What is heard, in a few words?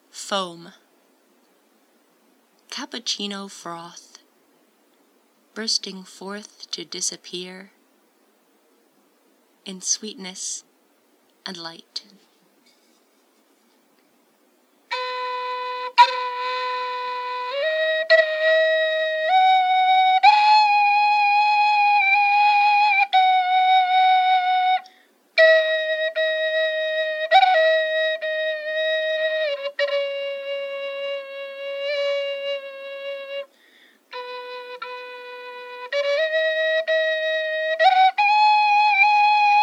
flute haiku